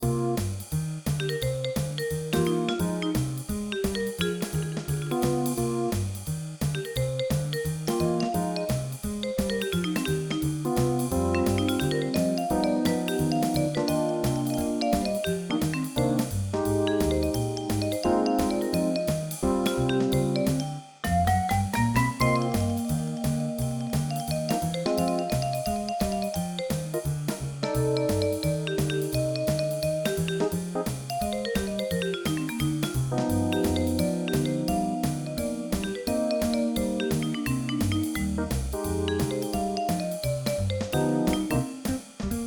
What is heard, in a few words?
zoop